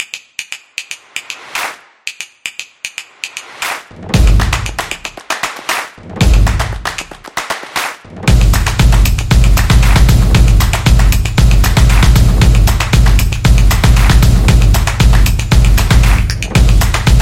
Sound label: A Drumming Upbeat, samba